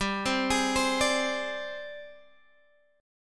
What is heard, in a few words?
Ab Major Sharp 11th G